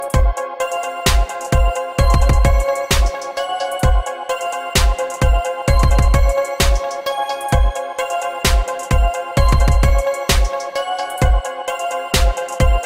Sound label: Infinix Ringtone